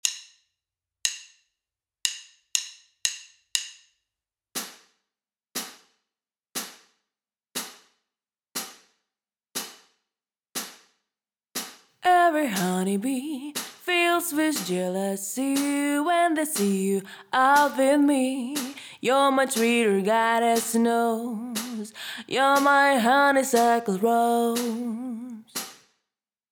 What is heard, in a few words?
Bb nopiano